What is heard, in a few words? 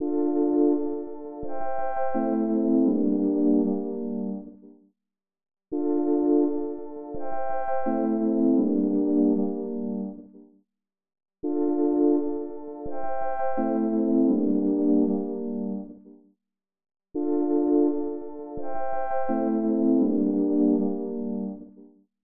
168 BPM D Min Chords; Melody Loops; Always Yours; Cymatics; ANALOG EVOLUTION Beta; RnB Collection